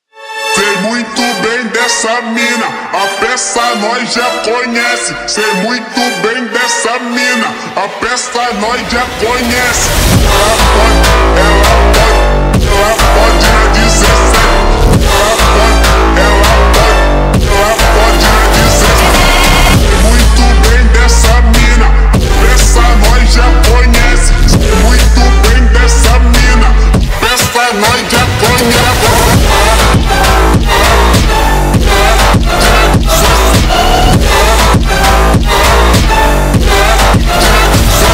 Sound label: PHONK